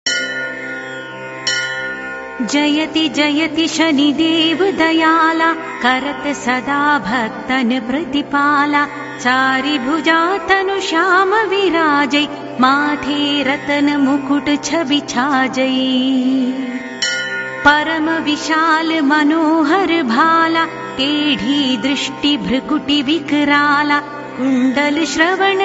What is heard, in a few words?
shani chalisa; deities